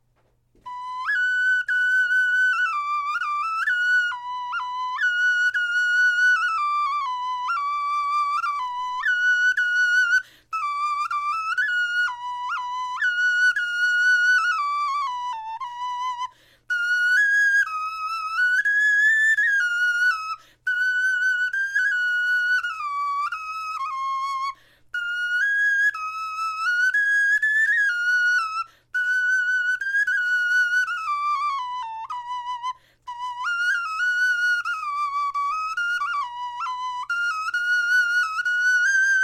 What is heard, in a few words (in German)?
melodie, knochenfloeten